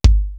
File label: butter kick; East West; Global Sounds